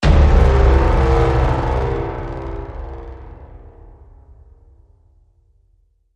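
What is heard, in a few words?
Blast; Cross Fire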